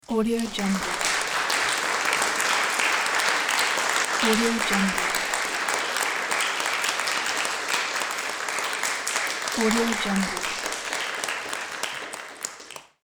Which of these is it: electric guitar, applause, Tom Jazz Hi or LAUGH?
applause